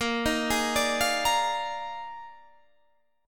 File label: D sharp Major 7th Suspended 2nd Suspended 4th, A sharp, guitar chord